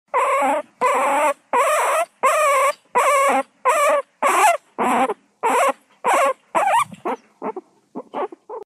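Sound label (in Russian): морская свинка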